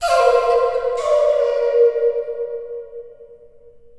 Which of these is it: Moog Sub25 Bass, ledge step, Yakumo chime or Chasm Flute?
Chasm Flute